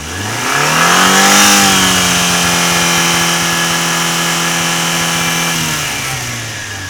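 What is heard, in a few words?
rev fade, Engines; E-MU Producer Series Vol. 3 – Hollywood Sound Effects; Ambient Sounds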